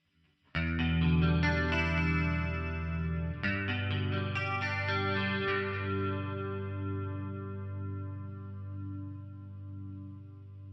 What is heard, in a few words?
clean